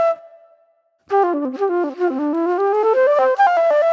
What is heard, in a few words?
Movie Flute